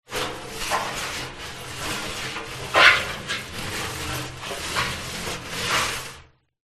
metal oil drum rub scrape movement grass; foley

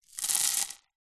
small stones handful drop onto paving stone

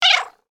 animals cat meow; sound effects one

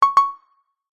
notification ui simple alert attention

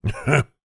cartoon male laugh boss; zapsplat

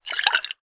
vodka in bottle movement; food drink

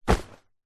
foley luggage rucksack backpack drop on grass

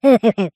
cartoon laugh high pitched

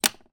office pen biro plastic down on table

